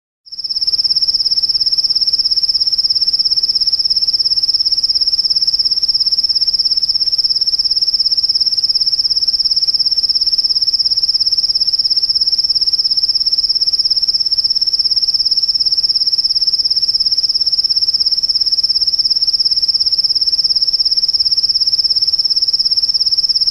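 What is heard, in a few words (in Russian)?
sverchok zvuk, cricket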